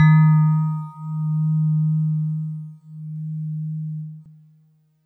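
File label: DX100 D sharp 3; David Lynch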